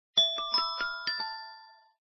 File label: Respiration